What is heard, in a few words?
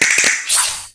Boss Attack; MAD